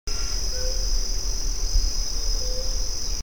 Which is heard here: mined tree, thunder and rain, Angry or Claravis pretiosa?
Claravis pretiosa